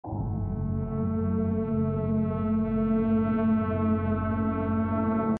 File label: Scary background; پس زمینه ترسناک